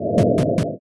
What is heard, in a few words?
chair roll